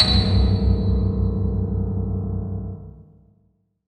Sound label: Piano Fail; gamerip; The Forgotten Ones